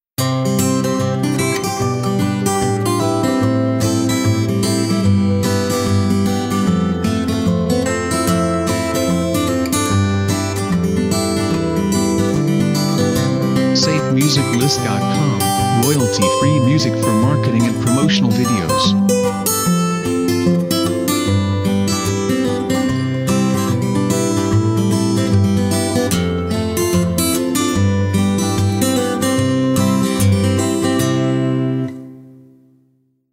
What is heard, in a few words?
Happy Acoustic Intro; SafeMusicList